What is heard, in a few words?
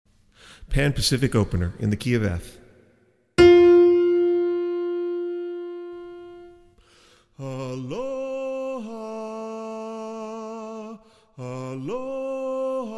Bass